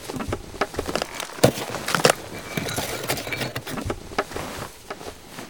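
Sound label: refrigerator idle; Modern Warfare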